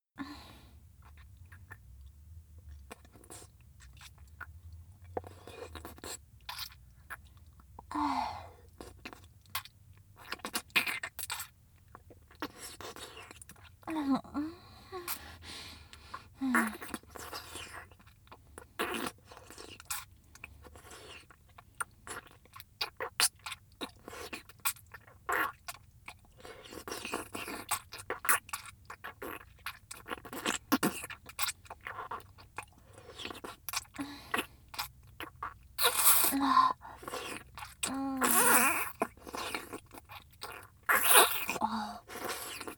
フェラA(軽); 清楚ビッチエルフ姫のメガ盛りマントロフルコース

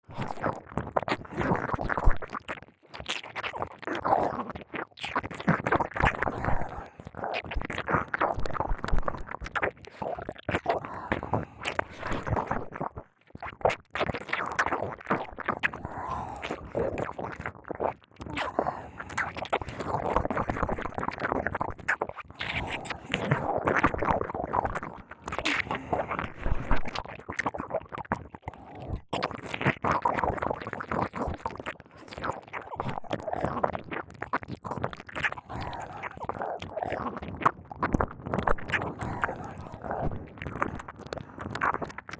耳舐め; セシリア; ゲーマーシスターさんと小悪魔シスターちゃんのダブルドスケベ懺悔射精マゾ性癖矯正プレイ

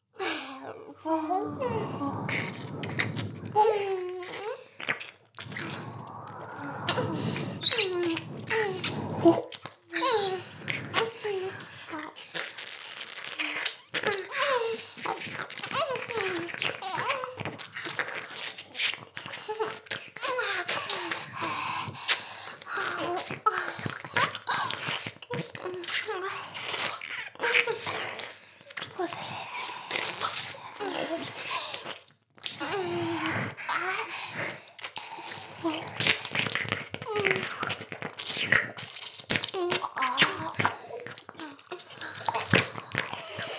左右同時耳舐め＋フェラ, ASMR; 全妹計画２; メスガキ妹

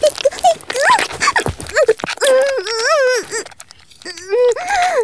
絶頂 中出し; 騎乗位

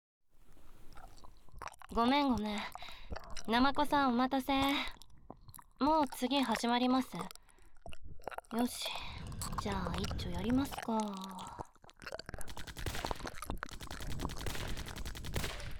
濃厚キス、耳舐め、フェラ の後 バック、騎乗位 で オホ声で絶頂する音声; ゲーマーの彼女がネットをつないだまま

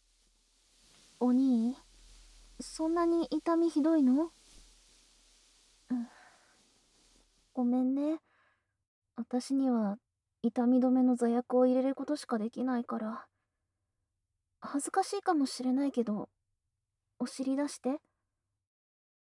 授乳と耳ふ～（チュパ音）, ASMR; 尿管結石

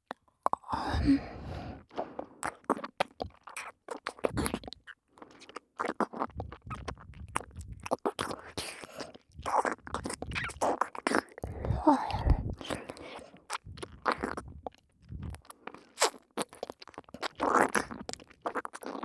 発情ご奉仕耳舐めループトラック, ASMR

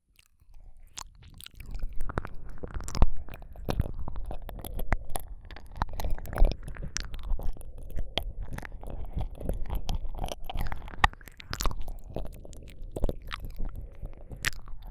ふわふわたっぷりじっくり耳舐め 両耳同時; 両耳同時ver; 【超耳舐め特化】ふわふわ気持ちよくなる耳舐め【安眠ASMR】

